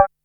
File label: DR-660 Perc, Drums, Boss DR-660